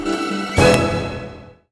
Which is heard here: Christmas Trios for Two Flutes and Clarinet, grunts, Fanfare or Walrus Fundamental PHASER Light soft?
Fanfare